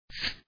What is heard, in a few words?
Shake; papas pastaria to go; android